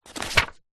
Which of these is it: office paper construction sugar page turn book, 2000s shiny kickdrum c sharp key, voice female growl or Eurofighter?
office paper construction sugar page turn book